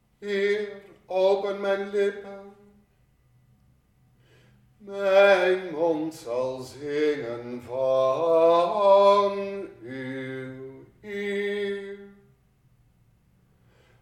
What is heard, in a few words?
Preces tenor